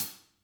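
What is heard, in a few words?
hats, Interactive Drum Kits; Sonic Reality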